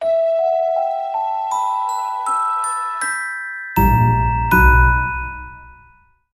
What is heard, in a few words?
Gate Jingle